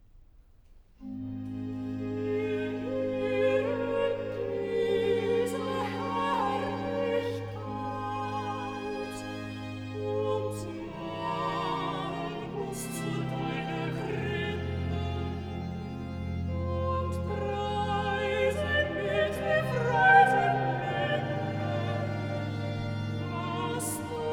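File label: recitativo, duetto, soprano, alto; vocal; BWV062; Nun komm, der Heiden Heiland II; Wir ehren diese Herrlichkeit; BACH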